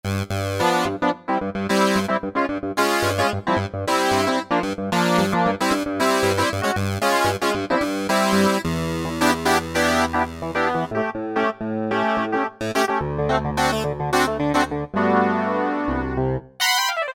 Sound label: Juno Clavi, Roland SRX-07; Roland SRX-07 Ultimate Keys